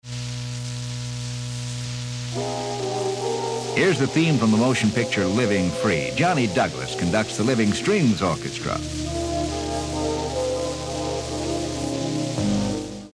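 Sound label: beautiful music air check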